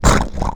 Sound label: fat wiggle